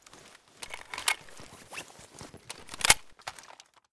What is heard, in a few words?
ashot load, weapon